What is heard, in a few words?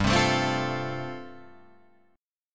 G 9th Suspended 4th G down Guitar Open G